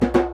conga licks; Roland LCDP14 Africa VOL-2; BTS Conga Beats

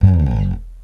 DOWN BASS, ELEC BASS; East West - Global Sounds

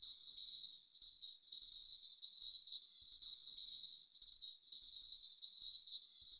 Loop, Esoterik Beatz; Sonic Foundry (Sony Creative Software); Agrymet

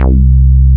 BASS C3 MF; MR.J BASS

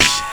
HIT, Hits & Brass

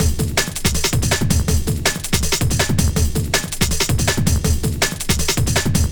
162, breaks; M8

